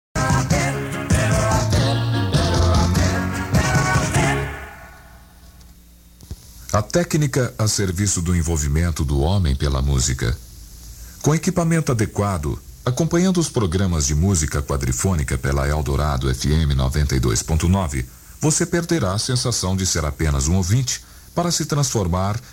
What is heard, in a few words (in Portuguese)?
Eldorado FM SP musica quadrifonica